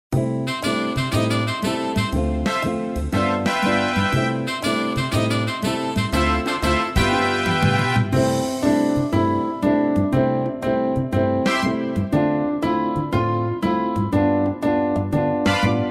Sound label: Keyboard jogging, piano; pistas-piano